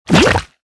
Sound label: lava fall in